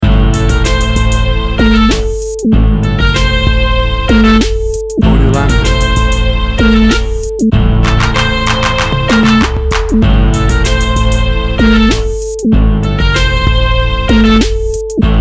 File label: A Digital Middle East